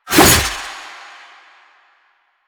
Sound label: Sickle Whoosh; Warframe; Lasrian; Lasrian Soldiers; Weapons; Lasrian Butcher Sickle